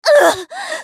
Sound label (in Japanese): ファイター女 ダウン